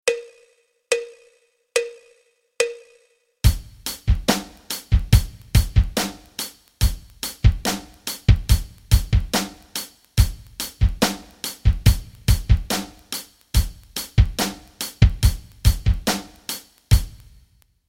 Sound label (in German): rock rhythmen